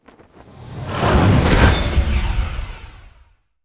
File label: demon; monster